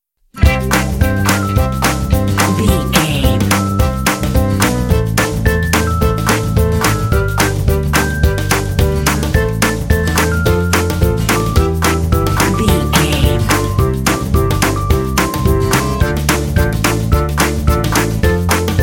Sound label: happy happy